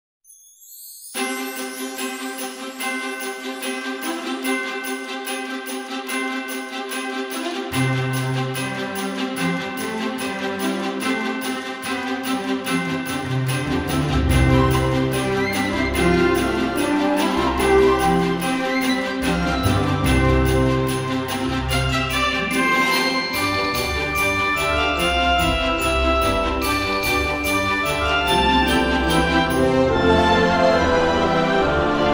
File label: Upbeat Christmas Background Music